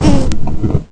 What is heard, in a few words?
punch